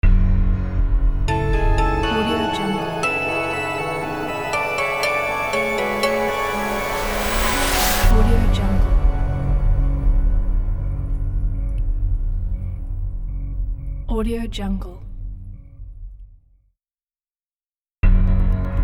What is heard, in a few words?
emotional piano logo